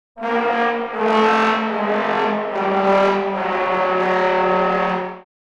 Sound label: Cartoon disappointment failure